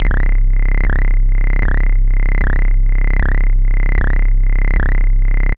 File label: SFX, synth atmos & fx; Dream Zone